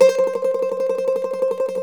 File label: MANDOLIN, Tremol Mandolin; StTremolMandolin